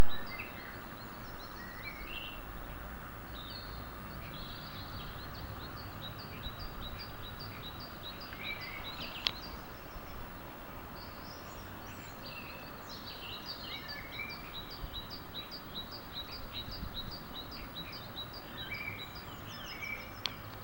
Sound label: spring evening chorus in Bromley